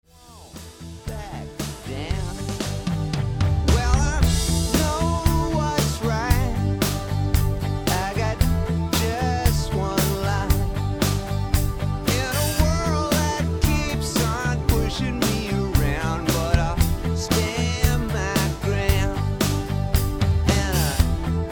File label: country; surReal